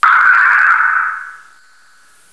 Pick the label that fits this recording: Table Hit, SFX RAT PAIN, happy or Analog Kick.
happy